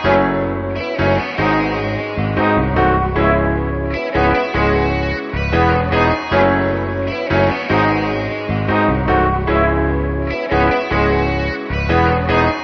guitar and piano, Phonky Loops

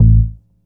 groovy, Bass

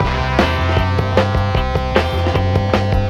full, Loops Phonky

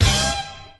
disco call, Stabs

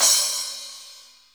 misery machine, Cymbals

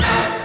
elektro

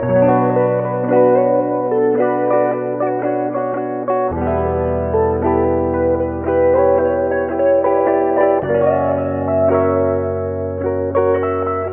guitar wistful, Sad; Loops

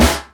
Snares, Real

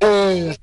"ooh" male

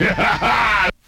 laugh devil

